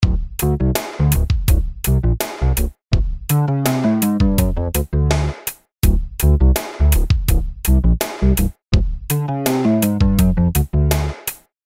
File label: Bass